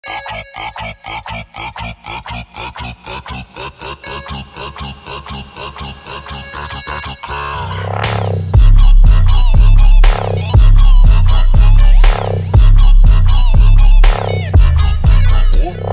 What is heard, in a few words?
brazilian funk